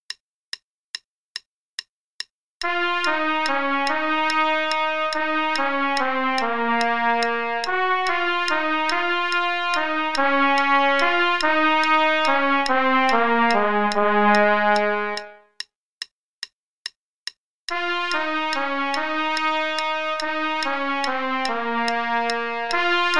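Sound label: Play-a-long, in Db, trumpet part, quarter note = 144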